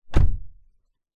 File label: suv door close gentle internal nissan patrol